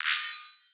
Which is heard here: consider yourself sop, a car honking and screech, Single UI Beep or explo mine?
Single UI Beep